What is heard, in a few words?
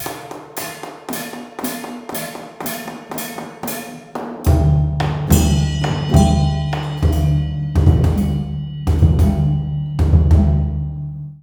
MALAY PHR L, Heart of Asia